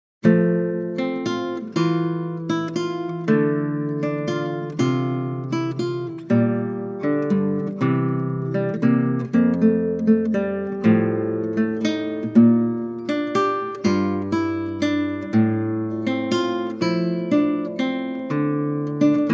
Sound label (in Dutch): Klassieke Gitaar; Bach 50 Solos